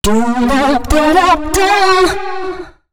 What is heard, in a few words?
female c#, Dance Ultra Voice FX; Mutekki Media